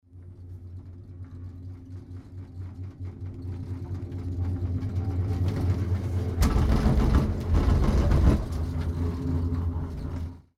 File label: Cable Car Tower Pass